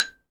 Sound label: XYLOPHONE, Percussion; ILIO